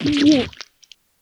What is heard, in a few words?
cough